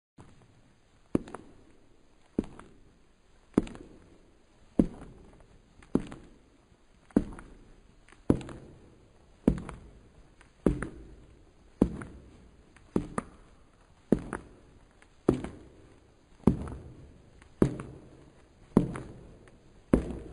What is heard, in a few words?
walking slow sound effect